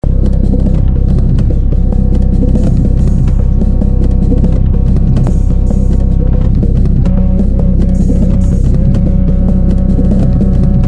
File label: drums; ikiam